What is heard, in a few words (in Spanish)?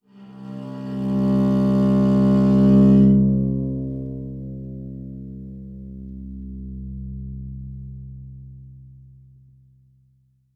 guitarra